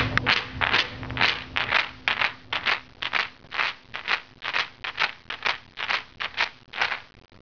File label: marching men